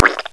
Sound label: squish